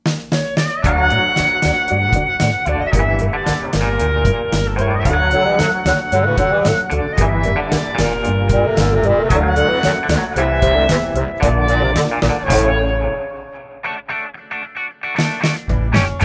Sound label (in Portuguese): trilha comica; vivo